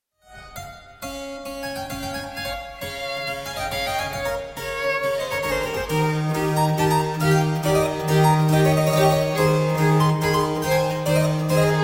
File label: trio for violin cello and piano in a major; op 2 no 5